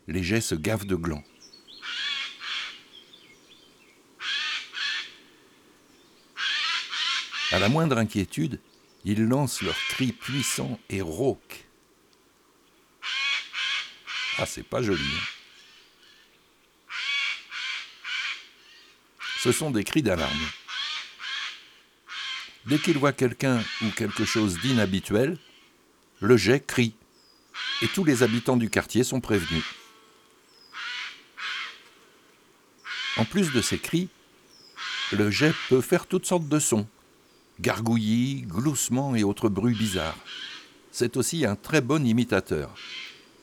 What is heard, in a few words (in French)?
Automne Le Geai des chenes